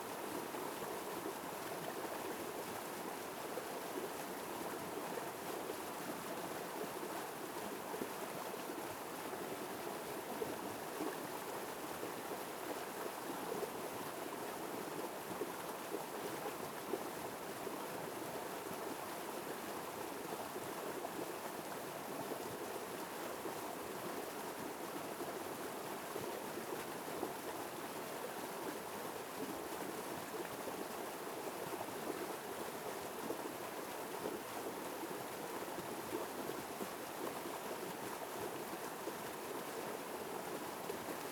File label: Flowing Water Beside Rock Creek; alaska acoustic highlights; GEOPHONY